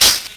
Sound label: CABASSA, Ragga & Dub Reggae